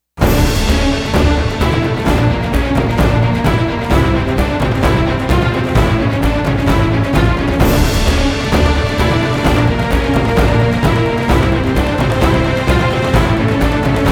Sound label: no choir